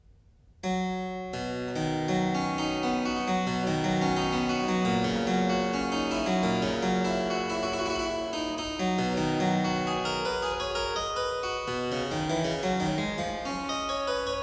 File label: Prelude in C major, Miscellaneous pieces for the harpsichord; Complete Chamber Music; Brilliant Classics